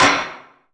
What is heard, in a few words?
gavel